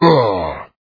gnool attack

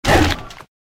Lizard Wound

